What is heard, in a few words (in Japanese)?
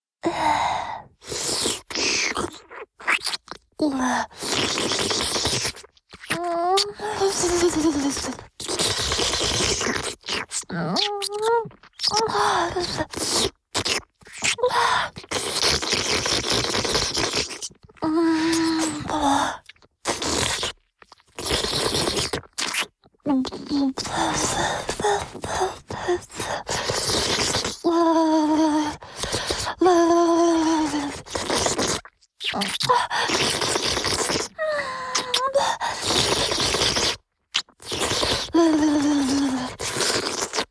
フェラチオ(中):亀頭を激しく舐める, チュパ音, ASMR; チュパ音抜粋集